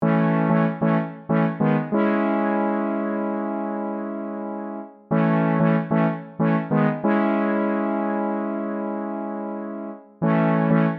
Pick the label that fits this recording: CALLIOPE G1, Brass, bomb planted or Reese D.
Brass